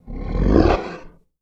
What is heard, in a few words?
beast; wagic; mtg